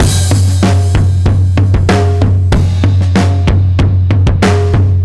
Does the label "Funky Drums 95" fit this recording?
Yes